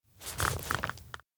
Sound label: map tear drop multi; anemoi パッケージ版